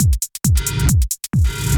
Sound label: drum; widdagroovebox